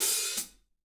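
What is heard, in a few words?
hat open close, drum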